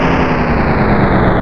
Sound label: bulldozer stop; mother2